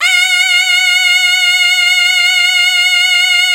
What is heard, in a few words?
mizmar